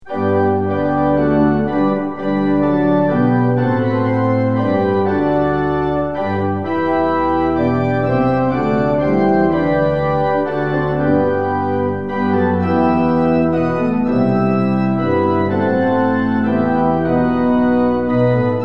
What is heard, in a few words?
tune; hymns; lillenas